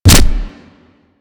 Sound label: Generic Ability Impact; DE; Warframe; Warframes